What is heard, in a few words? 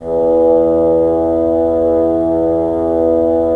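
wind bassoon; LA Composer vol. 3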